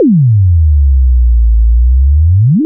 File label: Jump Sound; The Matrix; Trinity; Plain Single